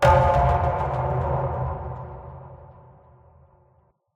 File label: ui levelup; The Eternal Cylinder ps4 ps5 windows xbox one xbox series xs gamerip 2021